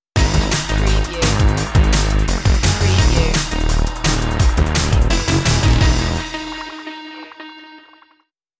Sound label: lost in space stinger